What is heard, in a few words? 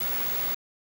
Tv Static Loop; Stair Horror